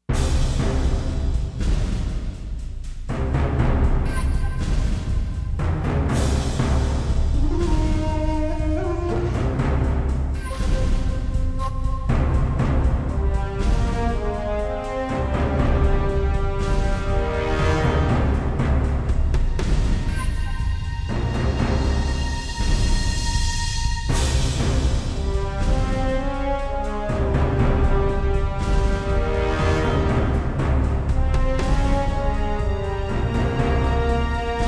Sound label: music